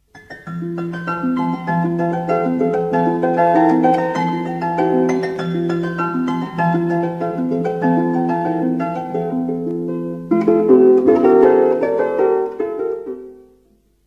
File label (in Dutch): Harp